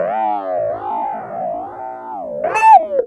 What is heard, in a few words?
Clavinet; Ambient Grooves